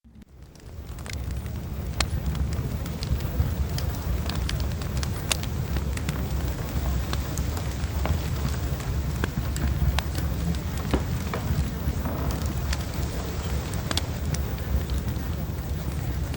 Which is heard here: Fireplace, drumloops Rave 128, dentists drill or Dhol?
Fireplace